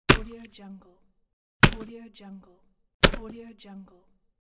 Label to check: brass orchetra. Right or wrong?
Wrong